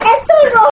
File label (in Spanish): el turbo